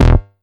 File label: Synths C; Vengeance Samples